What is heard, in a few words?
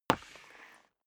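step boots wood parquet slide long, footsteps; menschen; schritte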